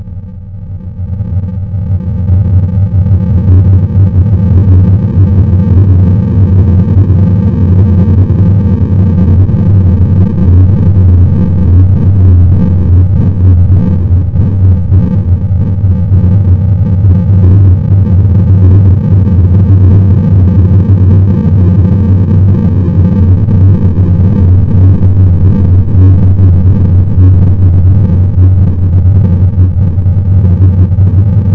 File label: ufo; yuris ufo